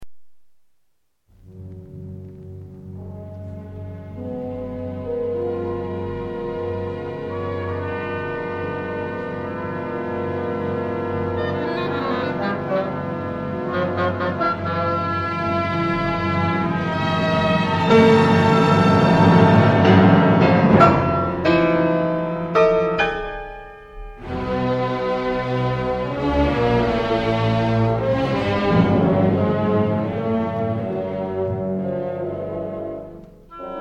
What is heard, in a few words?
Piano Concerto